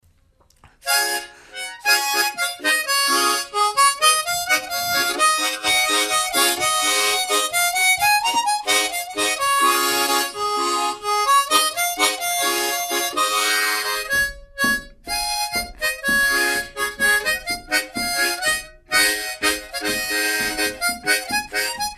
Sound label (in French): tambours; Trois jeunes tambours